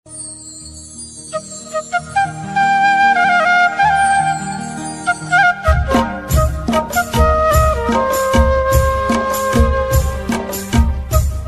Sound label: flute music ringtone bansuri ringtone flute sad ringtone; 2021 mobile ringtone